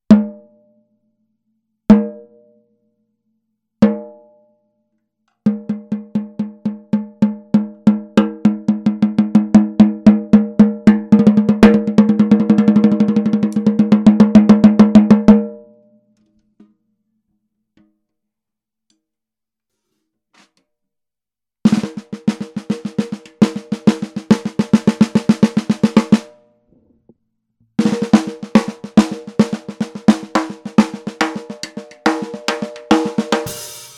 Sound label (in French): SONOR PHONIC ROSEWOOD 14X65, CAISSES CLAIRES